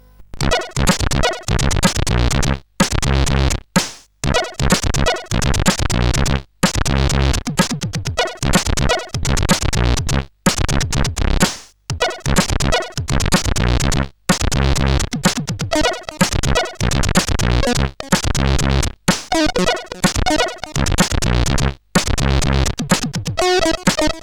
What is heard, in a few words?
One Channel Music, sid CSG8580R5